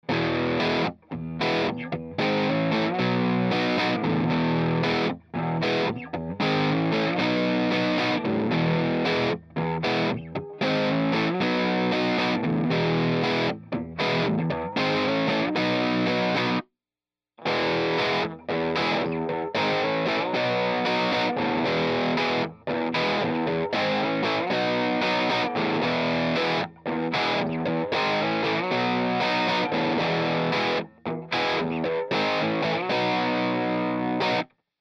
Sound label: Celestion Greenback